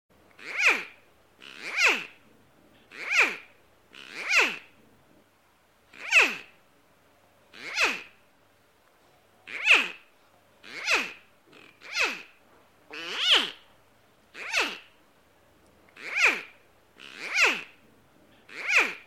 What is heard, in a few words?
croc sound